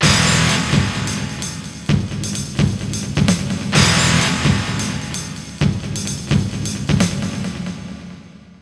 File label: Str G#